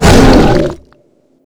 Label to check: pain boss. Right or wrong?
Right